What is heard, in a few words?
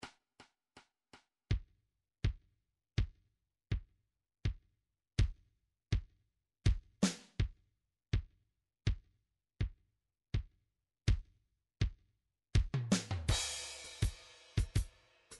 softrock